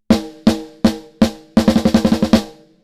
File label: snare; TARGETS ENGAGED